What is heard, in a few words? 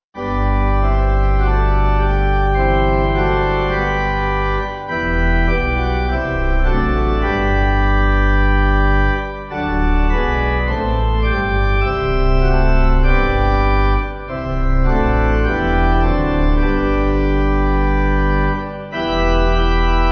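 Pipe organ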